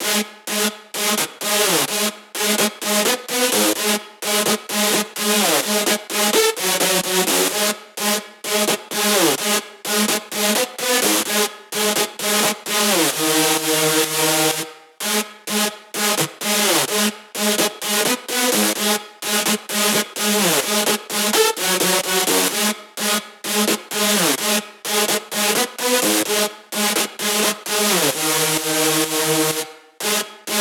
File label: 128BPM Synth layer wet SC, Root G#min; Vengeance - Essential Clubsounds Vol.5; Demo Constr Kits; Break the Chains